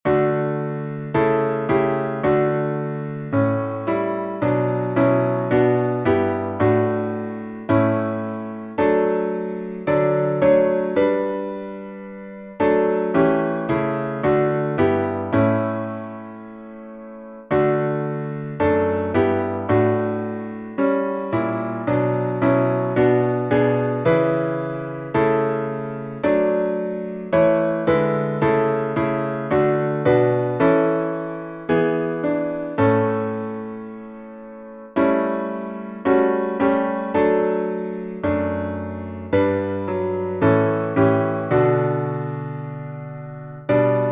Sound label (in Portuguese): salmo playback